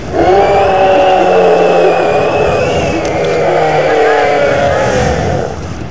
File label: scream